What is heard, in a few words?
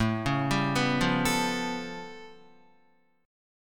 A Augmented 9th A, Guitar; arpeggiated; JGuitar